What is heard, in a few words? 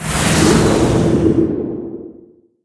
Prison Door Open; Dalaran; Doodad